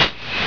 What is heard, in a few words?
AIRLOCK, MACHINES